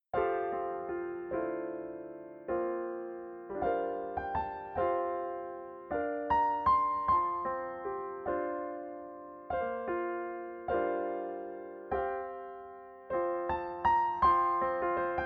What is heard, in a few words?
solo; Praise to the Lord